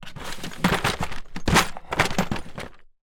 industrial tool tidy plastic drawer close hard clumsily; sound effects one